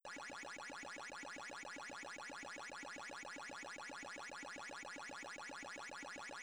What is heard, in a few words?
frightened